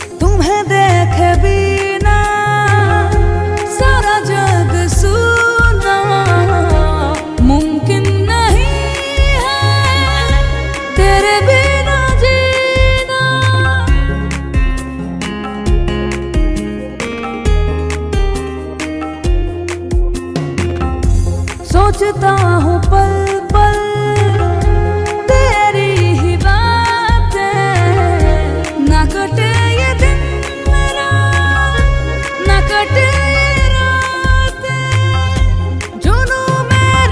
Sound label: Romantic Hindi Song Ringtone